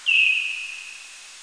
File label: leisler, bats